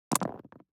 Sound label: DICE on Neoprene, Throw and Roll, Standard, 5 Five Dice; Samples; ArticulatedSounds; DICE Sfx Party (Extended)